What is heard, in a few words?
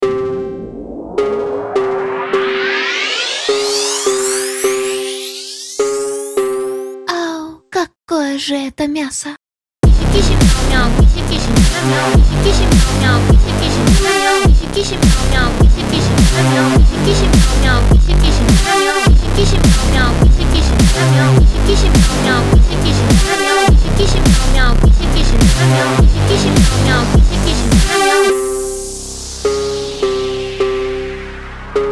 Fonk